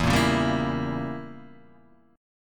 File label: E Diminished 7th E down Guitar Half Step Down